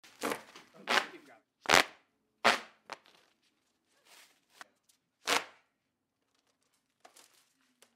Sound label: hand fan